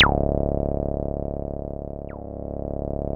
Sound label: C2, synth; 90 s Sample CDs; NiceBeats; SynthLine VOL-29